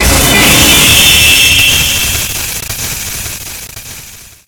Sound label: Jumpscare; CHica; Five Nights at Ronald's